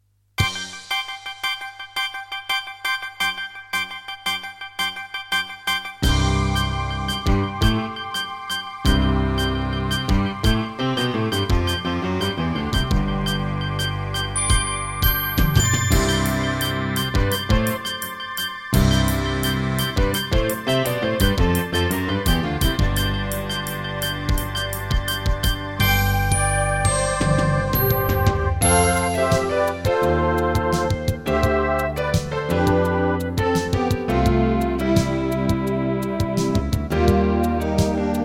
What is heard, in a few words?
Terratec MiniWaveSystem WT64